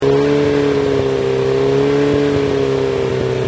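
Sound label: 4000 akra, Yamaha XJR exhaust; akrapovic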